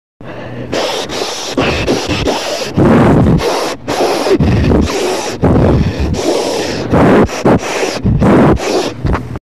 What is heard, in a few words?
sniff